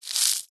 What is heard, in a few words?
foley foliage dry short crush squeeze crunchy crispy